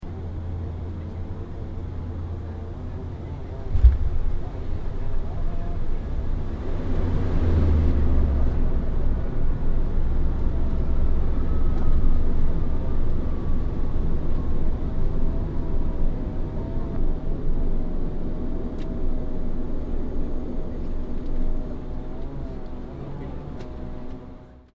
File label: aircraft bombardier dash8 landing interior stereo